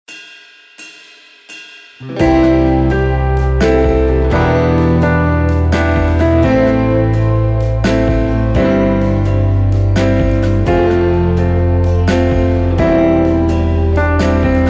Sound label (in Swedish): Vals i C dur